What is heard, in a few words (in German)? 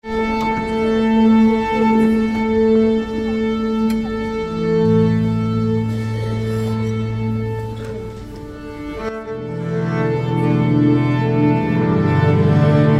Orchester stimmt